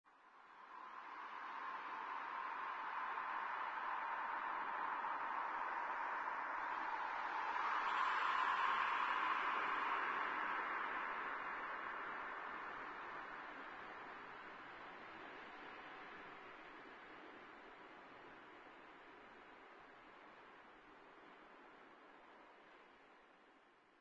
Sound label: Music Atmo; gamerip; Ballance